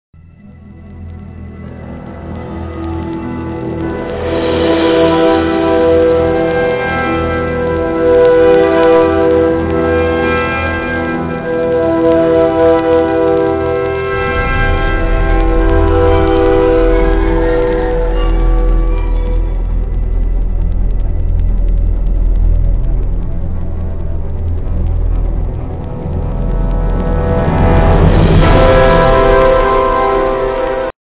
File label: Music; Matrix